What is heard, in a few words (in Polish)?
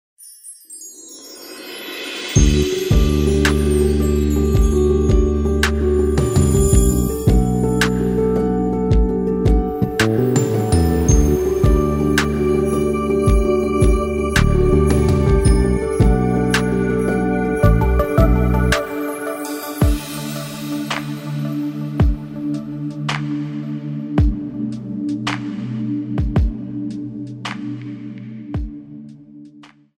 instrumental, Wersja instrumentalna